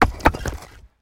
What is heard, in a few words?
building fall small